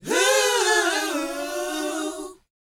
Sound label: LET, Vocal, R&B Dance, DANCE 120